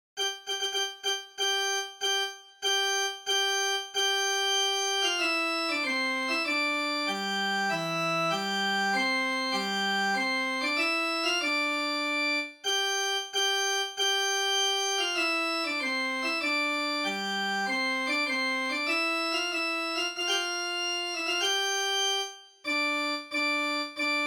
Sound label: trumpet tune, midi; purcell